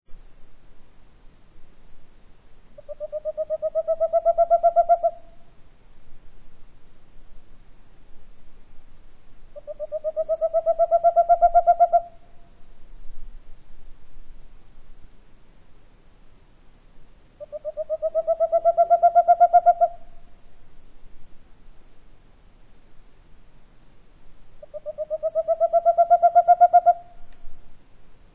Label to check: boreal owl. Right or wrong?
Right